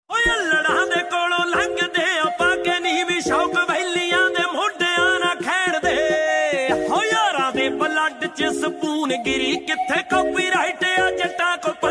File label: Punjabi Tune Ringtone